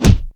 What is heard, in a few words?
PUNCH, FIGHT; 1000fx